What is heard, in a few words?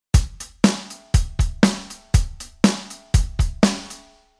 Rhythm